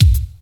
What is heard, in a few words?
natural kick b key, Royality free bass drum sample tuned to the B note. Loudest frequency: 775Hz